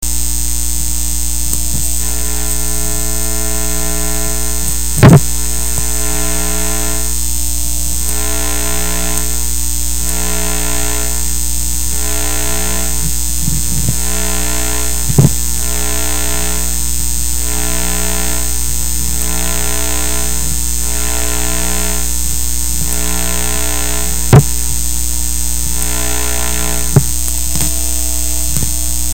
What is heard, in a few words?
hum